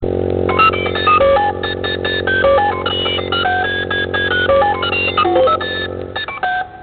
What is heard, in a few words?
Keyboard Ringtone